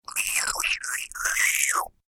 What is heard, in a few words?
Cartoon Gremlin Slurpy Drinking; Cartoon Voice Sound Effects